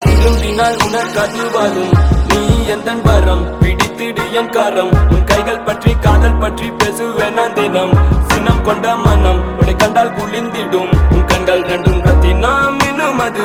Song Ringtone

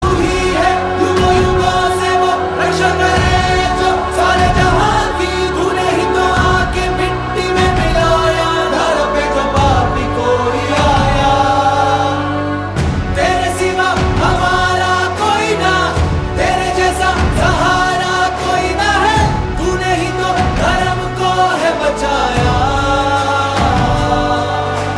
Theme Hindi Ringtone; Kalki

